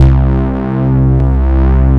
Bass Note C

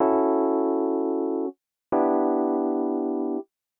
125 Rhodes E, Keys, Nu Jazz House

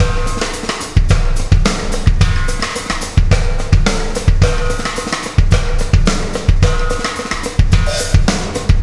Wormhole Beat, Processed Drumkits

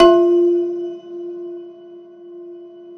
Rubbery Piano, prepared piano; Total Piano

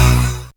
synth b, Dance Synths, Stabs & Hooks; Best Service ProSamples vol.37

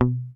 HOFNER MUTE, Bass

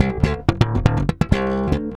REAL BASS, BASSLICKS; Ueberschall; Jam Box

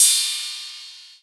Rides, Cymbals

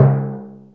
DRM G#, YAMAHA R5+RX; Best Service ProSamples vol.55 - Retro Sampler; AKAI

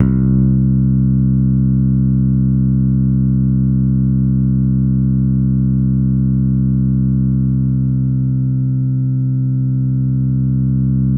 C2, SynthLine

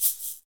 shakers

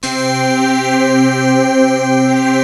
JV1000 PAD; Rubber Chicken Software Sample Bank

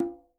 Quinto, Percussion

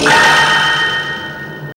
stabs; stabs & hits

